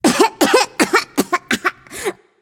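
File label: nonverbal clear throat; smite; voicepack; izanami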